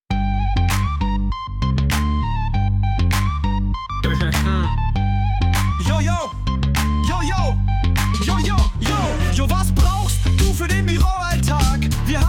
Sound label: HIPHOP